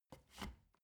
step ladder wood scrape single

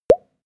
pop bubbles deep; koerpergeraeusche; menschen; soundpool

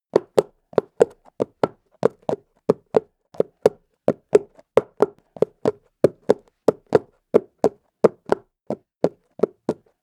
horse hooves clack walk asphalt; natur-tiere; pferde

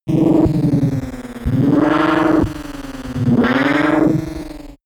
approaching defect hovercraft, raumschiff

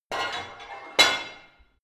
barbell heavy plate put on hard, fitnessstudio

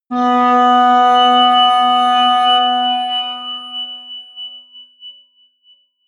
atmospheric feedback synthesizer high, drones

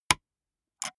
gaming mouse left click single slow hard; maschinen; buero

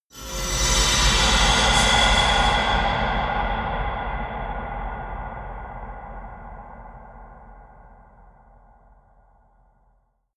eerie industrial swell

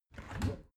window open heavy; objekte; fenster

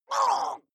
water monster female gargle groan pain short; koerpergeraeusche; comedy-cartoon; soundpool

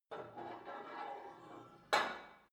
put weight onto barbell, fitnessstudio

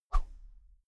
whoosh thin slow hard; swooshes-swishes

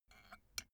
put tea spoon in cup unsteady; berufe; gastronomie; soundpool

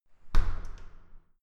entrance door close soft

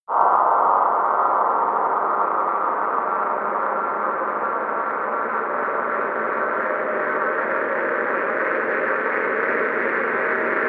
drone geiger counter

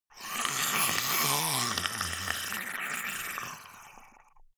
monster choking zombie idle high; koerpergeraeusche; comedy-cartoon; soundpool

